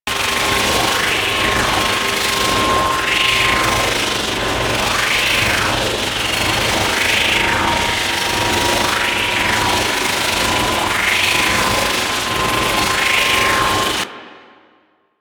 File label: Research Facil Power Generator Loop; Venus; Objective Rooms